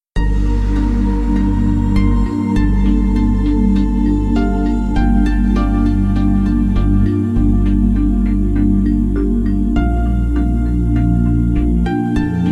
Night Walk

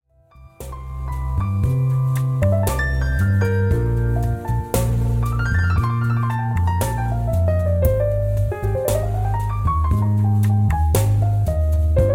Evening Relaxation

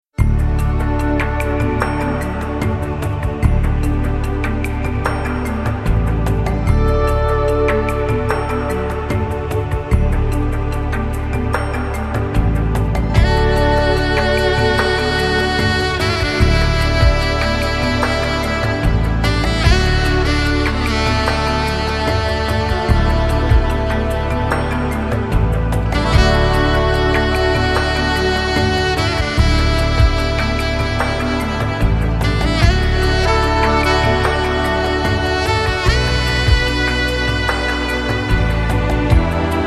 Dreams n Drums

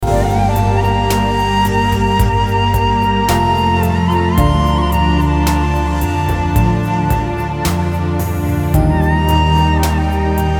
Highland of Tibet

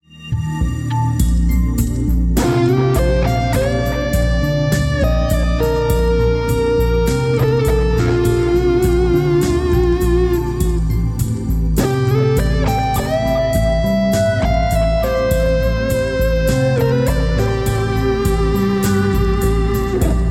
Smooth Conversations

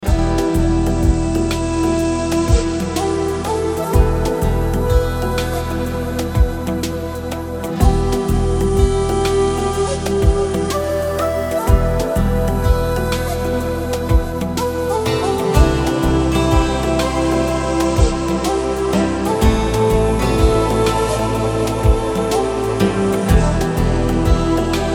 Meditation Shuffle

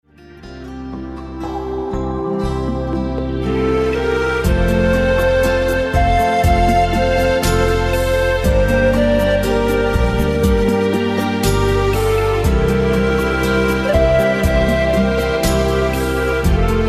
Springtime

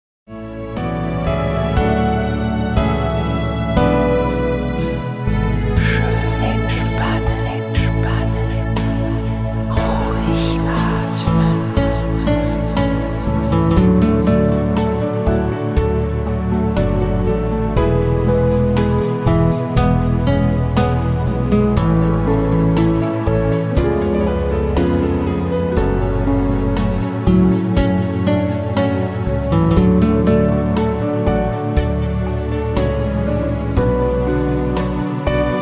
Power Napping

